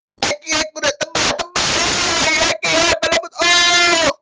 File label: earrape audio meme; portugues